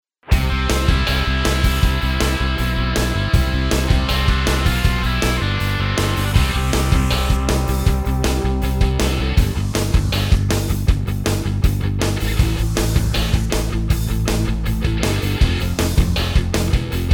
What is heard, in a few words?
classic rock